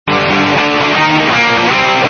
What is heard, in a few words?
rock guitar; Cokemusic; Mixer Library; Rock Sounds; Habbo